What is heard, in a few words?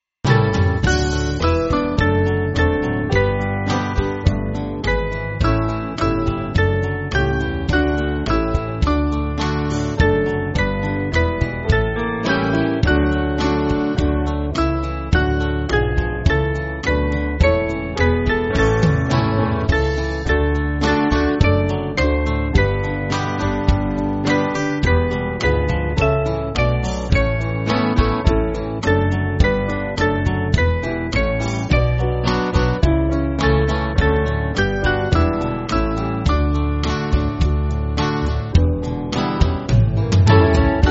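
Band, Free Music Backing Files for Churches; Now Yield We Thanks; Darmstadt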